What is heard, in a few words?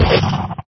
shift down